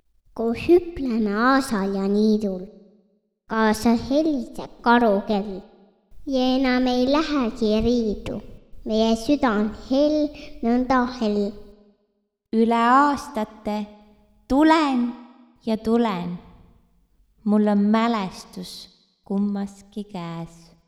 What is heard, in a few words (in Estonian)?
aasal ja niidul reverb; Laulupäev